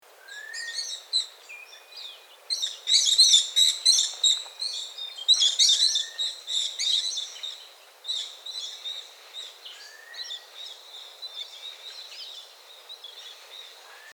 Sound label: sulphur winged parakeet